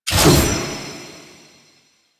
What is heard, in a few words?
Glaive Throw; Enemies; Weapons; DE; Warframe; Teshin